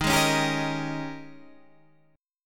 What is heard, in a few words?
G sharp Major 7th Suspended 2nd Suspended 4th